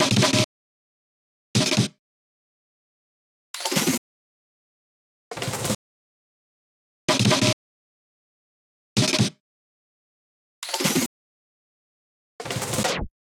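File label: drum fill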